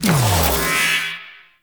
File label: Shield Armor Ability Cast; Warframe; Helminth Abilities